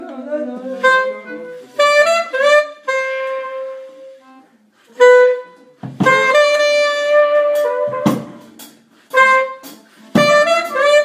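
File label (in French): Cool reggae Thème Trompette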